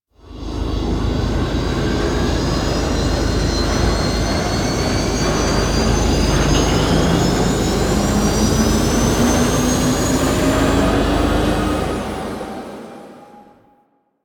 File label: Grineer Ship Mountain Pass Drop Off; Liset